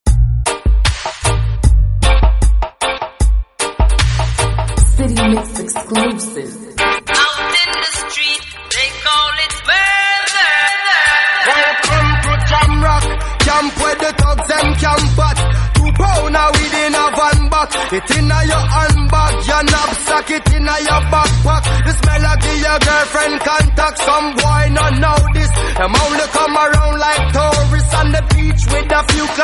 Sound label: reggae